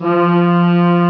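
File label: french horns F5; Star Trek JR Audio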